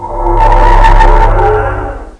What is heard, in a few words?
Sound up